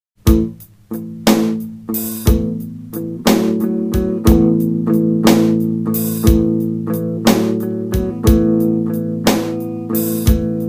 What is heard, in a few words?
johnson clean